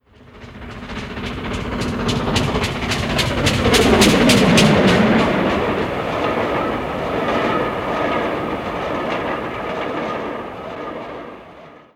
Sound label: Passing train